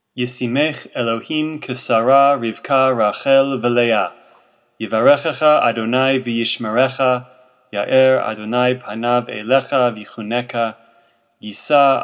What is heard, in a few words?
Blessing for Children - girls; friday night at home